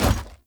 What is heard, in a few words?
Heavy Grunt Boot Walk Gravel, Footsteps; Characters; Enemy; Sinecure